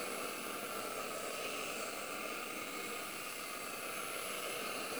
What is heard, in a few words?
steam